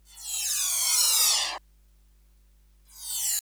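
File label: FX right, attacks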